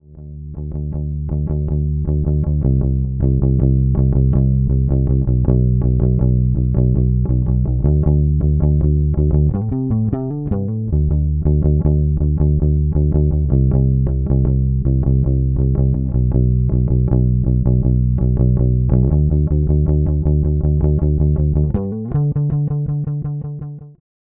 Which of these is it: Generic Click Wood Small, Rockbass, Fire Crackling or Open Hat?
Rockbass